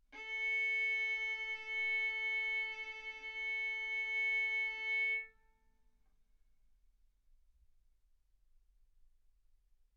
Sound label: Violin arco A4 B4